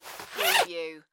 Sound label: Zipper Coat Down; Sound Effects Bible